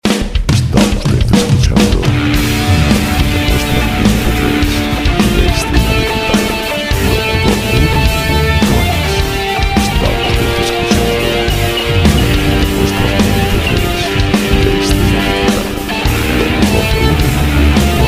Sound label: rock; Música "rock"